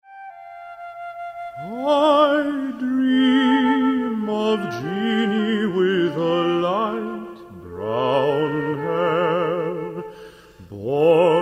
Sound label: polyphonic